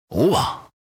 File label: panda Medium Win; shangrila